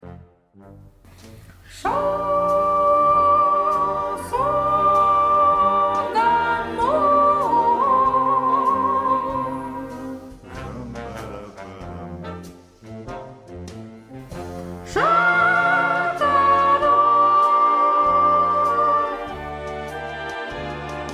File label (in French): Chanson d'amour Sop